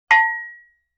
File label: Cowbell High; Vintage Cartoon; Drums & Percussion